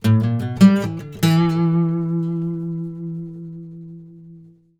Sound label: BARI LICK, Blues Guitar; ILIO; Fretworks; 96 BARI LICK